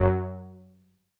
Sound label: 505, String Machines